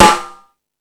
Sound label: Jazz Drums, E-MU Pro-Cussion